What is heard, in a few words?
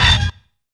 HORROR STAB; Zero-G; Funky Elements